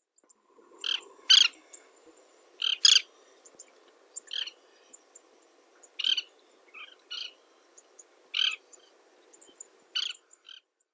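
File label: Oriental Pratincole flight; tsubamechidori; miyako; hishou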